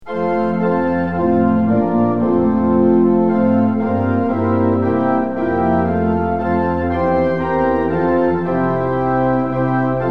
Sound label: tune; hymns; lillenas